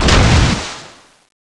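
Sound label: waterbomb explosion; sonic gran maja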